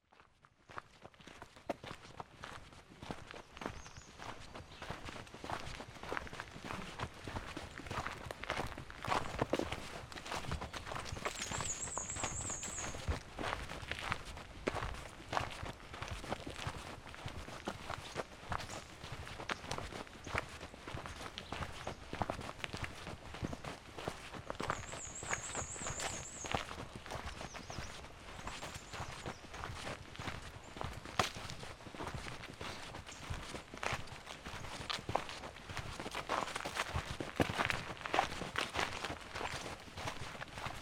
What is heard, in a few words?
Walking near Lake; Wisconsin 2019